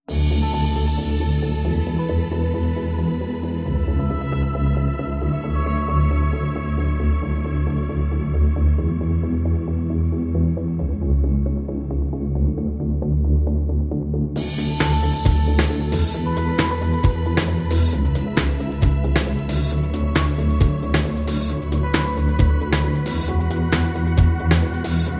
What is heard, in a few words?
No Guitar Mix